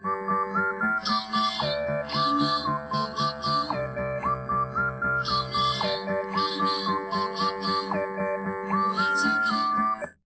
nodrums 130ms 4cm, underdetermined; SiSEC11

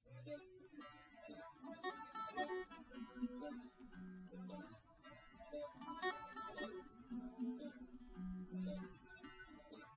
test nodrums liverec 250ms 5cm src; SiSEC08; SiSEC underdetermined

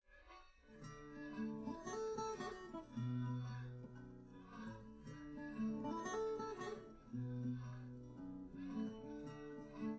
nodrums

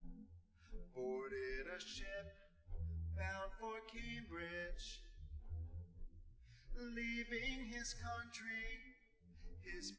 nodrums inst sim

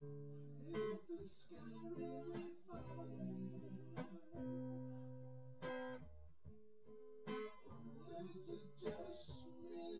nodrums; synthconv 250ms 1m sim; SiSEC08; SiSEC underdetermined

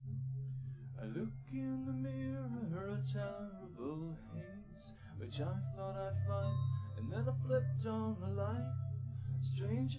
no drums live rec 5cm sim; SASSEC07

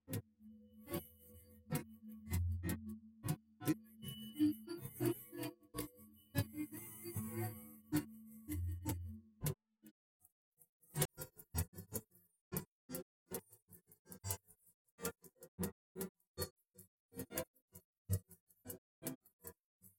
Brushes; ICASSP11

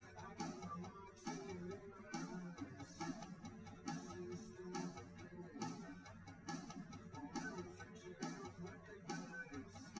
wdrums liverec 250ms 1m sim; SiSEC08; SiSEC underdetermined

